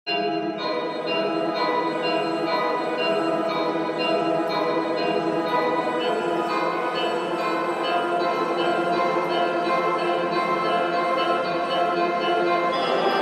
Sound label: FILM SUSPENSE